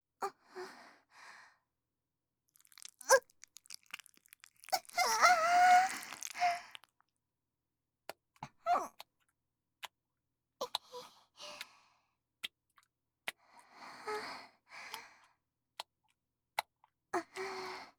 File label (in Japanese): 挿入 喘ぎ; SEあり